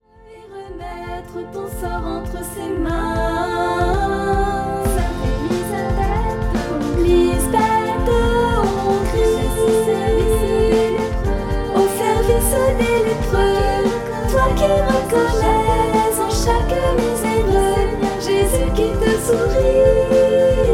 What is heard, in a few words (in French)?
sainte elisabeth de hongrie tenor